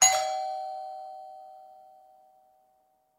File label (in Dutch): deurbel